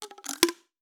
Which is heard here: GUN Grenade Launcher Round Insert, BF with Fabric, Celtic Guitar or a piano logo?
GUN Grenade Launcher Round Insert